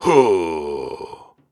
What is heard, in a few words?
Grunts and Chants, Viking Vocal